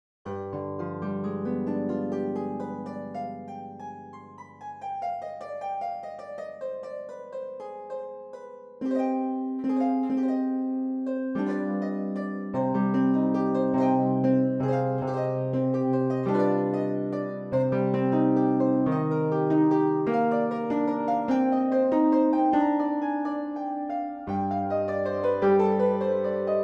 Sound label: morphing; hopkins; pianoteq7